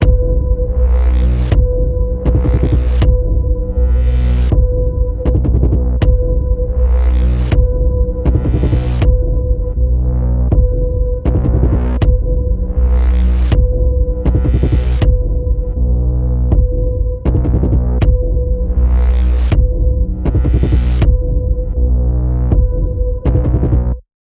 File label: Ominous Chase Panning Pulse B, Key of B